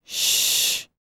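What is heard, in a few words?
female breath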